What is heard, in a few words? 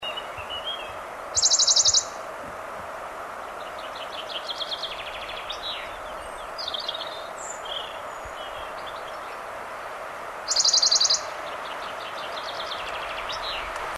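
Phylloscopus bonelli, Espanja; Spain, 16.4.2003; Linnut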